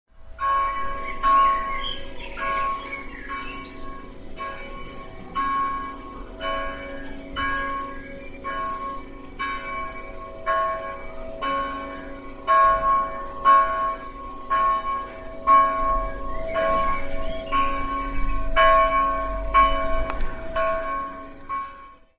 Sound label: Sunday Church Tune